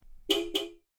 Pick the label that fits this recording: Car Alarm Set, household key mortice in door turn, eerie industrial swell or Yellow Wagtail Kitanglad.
Car Alarm Set